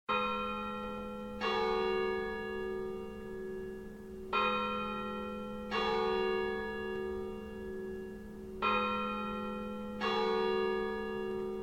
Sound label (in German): kloster ettal glocken